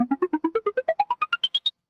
score count; monsterplex

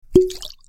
health pickup; LegendsOfCoyoteGulch

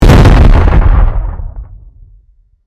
Enemy Bomber Death; overrun

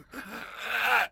zombie death; LegendsOfCoyoteGulch

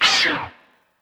vega weapon; fertig classic games

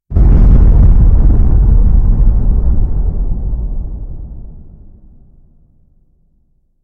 destroy; tyrants edge

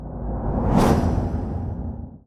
coin Woosh; alien-rush

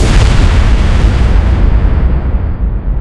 explode; Neon Blocks

